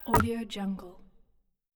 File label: glitch menu close